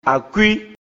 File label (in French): Sifflement; Mina; Àkwí